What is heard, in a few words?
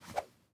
throw; teardown; ps5 windows xbox series xs